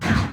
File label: distance point defense fire, weapons; Stellaris